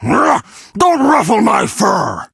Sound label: hurt; 拉夫上校